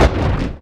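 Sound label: percussion fx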